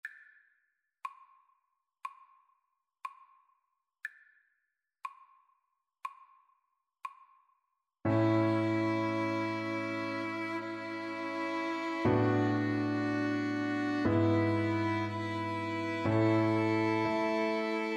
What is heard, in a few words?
adagio and allegro in a major